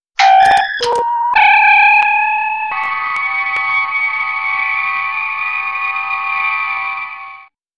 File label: Main Transporter